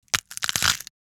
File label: Neck Snap